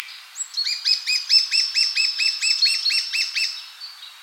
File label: Lesser Spotted Woodpecker, Kleinspecht